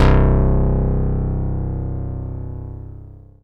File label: Dance Floor Bass, Multisample Basses